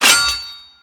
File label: sword block; smite; god arthur